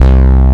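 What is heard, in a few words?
D50 synth bass; East West; Global Sounds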